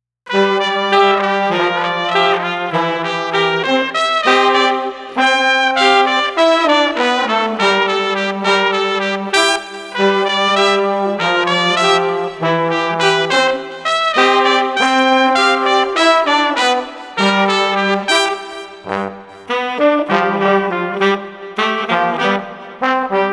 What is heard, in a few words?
Street Trumpet Tuba Flowing 98